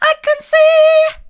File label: i can see soft, vocal